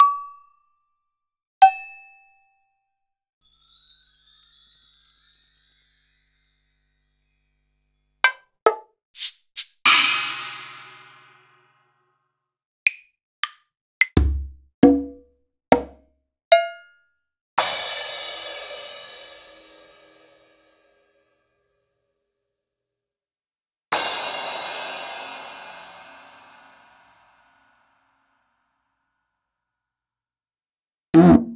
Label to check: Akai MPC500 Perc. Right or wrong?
Right